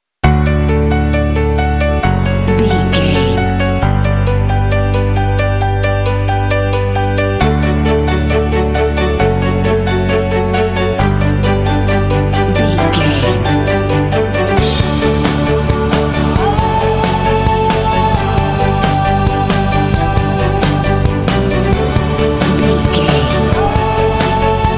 soaring inspiration and motivation, Bright and motivational music with a great uplifting spirit; Suitable for kids, sports and simulation game